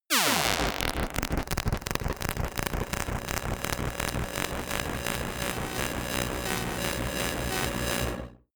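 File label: choppy noise swell, Science Fiction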